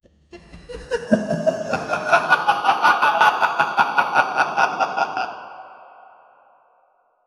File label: slow haunting laugh, ghost